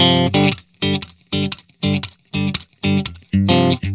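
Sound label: 120 G, Vintage Blues Guitar rhythm; USB Soundscan vol.22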